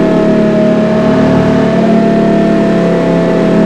low, engines; BMW M6

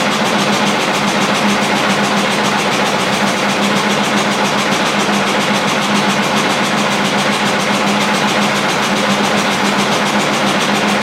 machine vibration

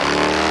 low, engines; RS7

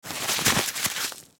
mask on

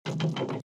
ramp

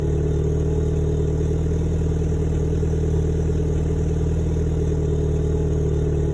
idle, engines; BMW B48

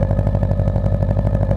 idle, engines; SUBARU

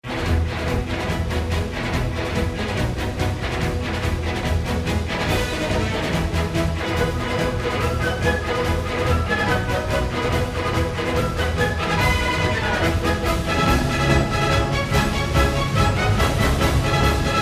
horse theme

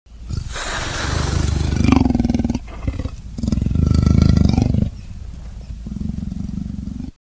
lion; rise hunting; animals

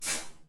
gear down, truck; gearshifts

